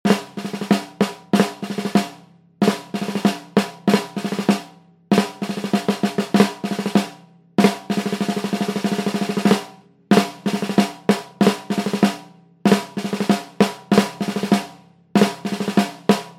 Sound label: Military Snare Drum March